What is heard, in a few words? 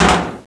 LOCKER DOOR CLOSE